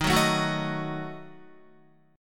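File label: E flat Diminished, Guitar; Ebdim strummed; 10,9,9,11,9,11; 4F,4A,5C,5G,5C,6E; JGuitar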